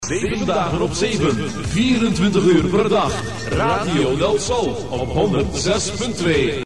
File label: jingle; Delsol Ninove